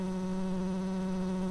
bumblebees, Hypnorum workers

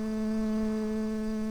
bumblebees, Hypnorum workers

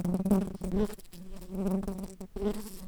Pascuorum workers, bumblebees

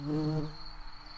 bumblebees, Pascuorum queens

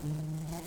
Lapidarius workers, bumblebees

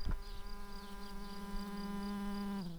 bumblebees, Hypnorum workers

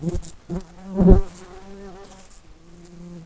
Pascuorum workers, bumblebees

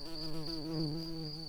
bumblebees, Lucorum queens

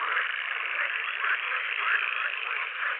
Pool-Frog, frogs

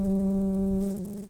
Humilis workers, bumblebees

